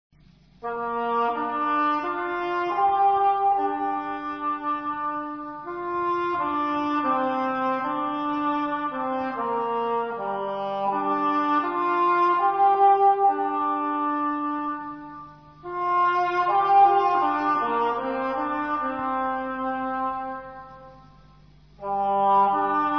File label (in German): englhorn